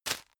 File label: tissue grab hard; objekte; taschentuch